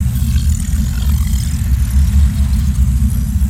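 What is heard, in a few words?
Ambience Dojo Earth Gate; LU; ambience ninjago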